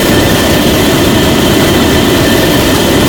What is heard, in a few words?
flame thrower loop; tf2classic